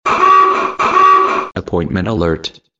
Appointments alert

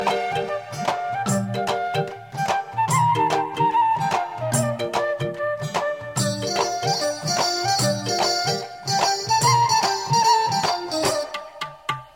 Ultimate Fluit Music

